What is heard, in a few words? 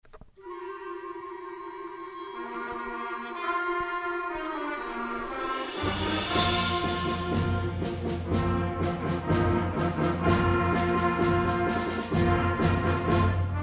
Autumn Fanfare